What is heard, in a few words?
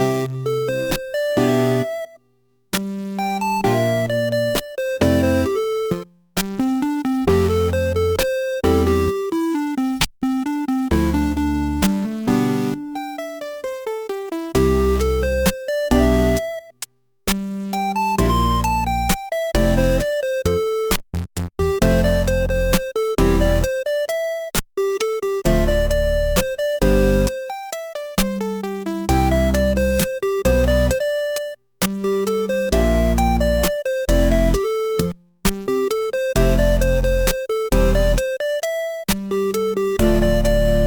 chiptune